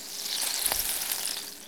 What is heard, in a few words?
sparks